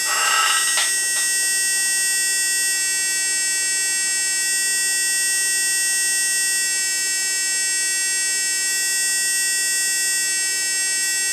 recording tonal monitor via em detector tone via a 28 Khz steady signal via sdr